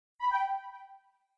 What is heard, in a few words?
Fold close, Nokia N76